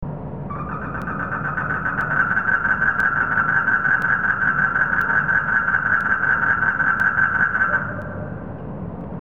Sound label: Frogs